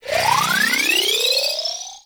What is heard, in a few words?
Pistol Active Reload Start; Operators; Drifter